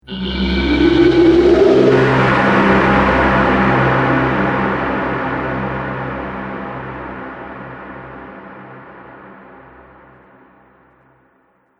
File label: Trailer Hit Monster; Trailer Sound Effects